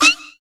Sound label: ELEC FX; USB Soundscan vol.24; AKAI; Industrial Loops